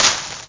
plant collision; Angry Birds Space

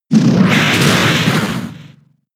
Sucker Punch; Pokemon; gen 6; XY; ORAS

